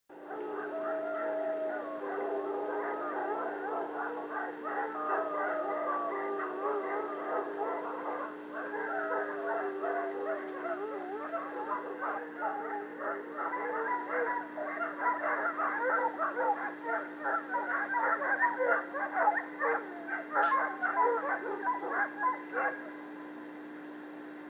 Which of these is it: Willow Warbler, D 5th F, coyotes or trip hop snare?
coyotes